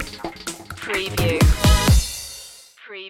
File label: grenade stinger